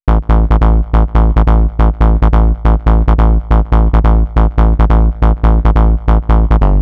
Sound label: 140 House Bass Amin; Dance DJ; Digidesign; Pro Tools; Pro Tools Essential Loops